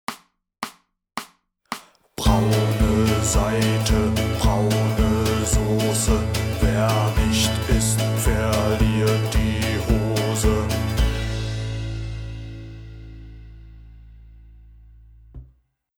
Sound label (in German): Braune Saite, Gitarrenschule; Titel 5 – Braune Saite